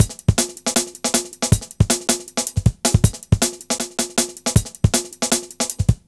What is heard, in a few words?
Flying 158, Drum Loops, Jungle; Jungle Warfare 1&2